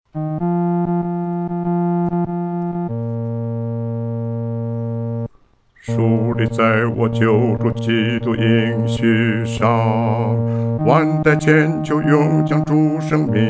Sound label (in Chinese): 独唱 第四声